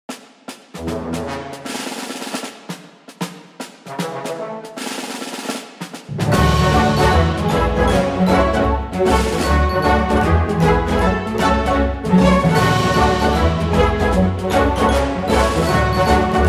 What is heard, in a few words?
instrumental